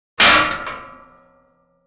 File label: metal gate hits wall; prosoundfx